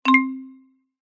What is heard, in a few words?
multimedia game sound slot machine single mallet chime plink